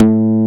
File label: DIGITAL BASS, BassGuitar; AKAI S6000 CD-ROM - Volume 1